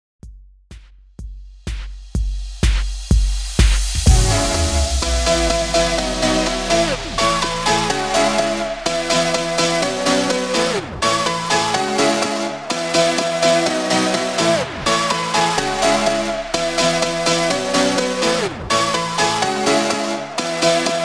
House Mix 125 BPM; GLAZUR

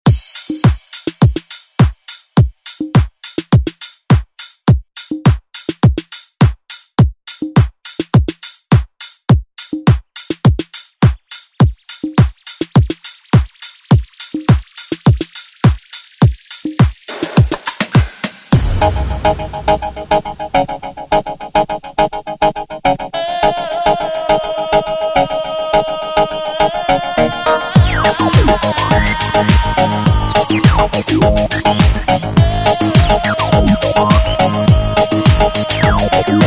re drum; DJ Mhark

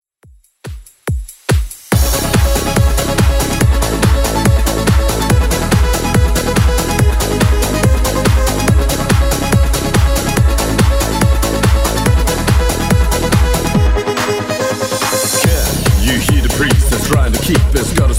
90s Redrum, 142 bpm

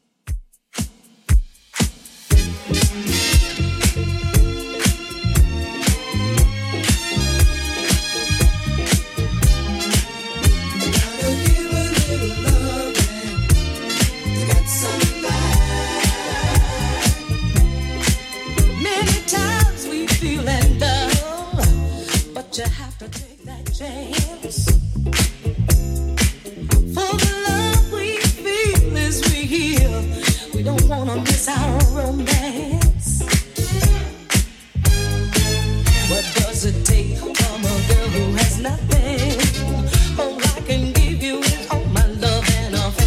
80s Redrum 118bpm; remixplanet

